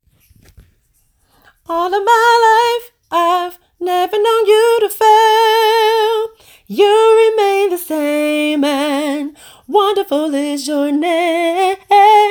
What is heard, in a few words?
Alto